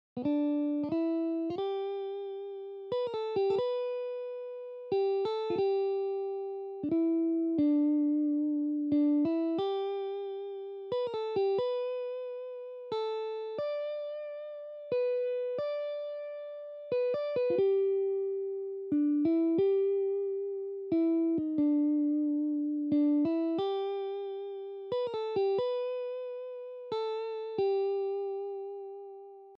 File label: Amazin Grace in Open-G